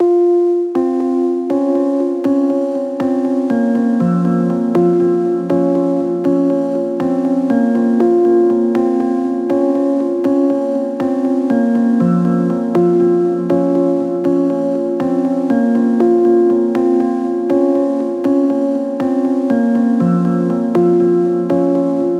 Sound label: Piano - Bbminor 60BPM; STEMS; Ghosthack; SkyRealm; Mood Kits; Mood Kit 07 - All Is Not Lost